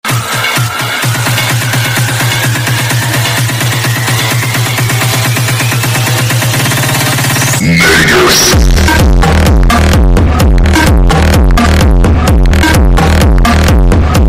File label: hardbass